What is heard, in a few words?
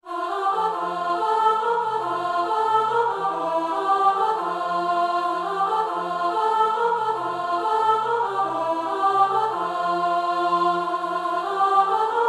Escondido Alto